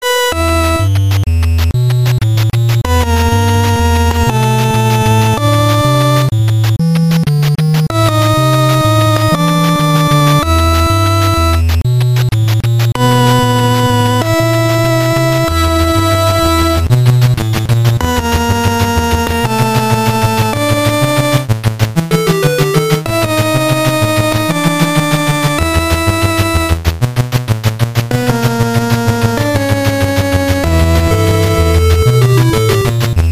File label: 8-bit - Intruder Alert